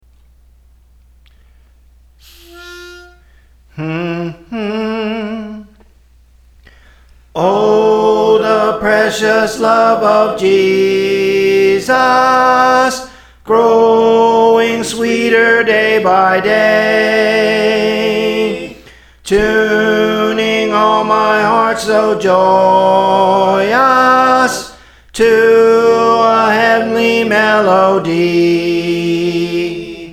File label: Soprano; Praise For The Lord Training